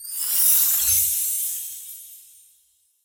wild transform; wilderland

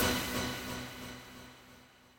near win end; east sea dragon king

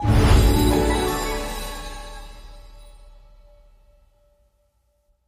sticky Wild Re Spin; fairyhansel

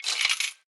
win Collect; casino holdem

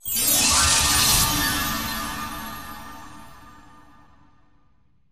fairy Throw; fairyhansel

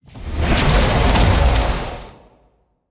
evil level up; arcane

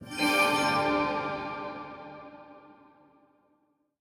glow Win High; templeofnudges

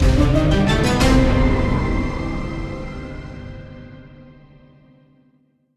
Freespin Outro Splash; godsoffortune

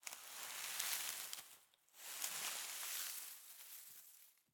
movement; the wolfs night

